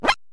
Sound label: land